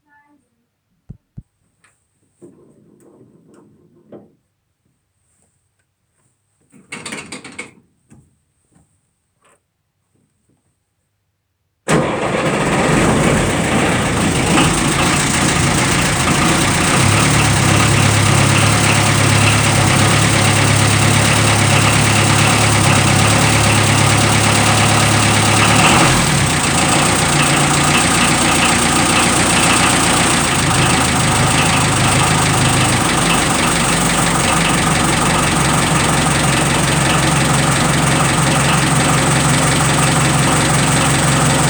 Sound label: Unimog start